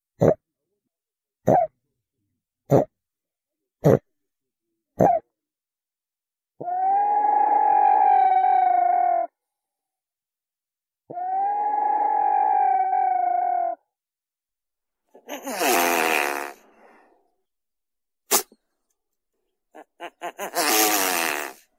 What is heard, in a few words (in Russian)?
drofa dudak